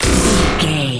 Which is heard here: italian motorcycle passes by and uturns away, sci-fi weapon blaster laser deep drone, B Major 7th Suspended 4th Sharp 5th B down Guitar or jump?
sci-fi weapon blaster laser deep drone